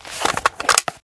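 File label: grenade launcher draw, weapons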